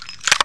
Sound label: ammo, pickups; KINGPIN